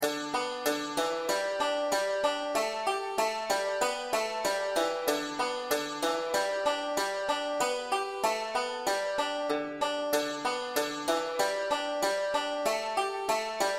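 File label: brethren we have met irish banjo g with melody; easy tabs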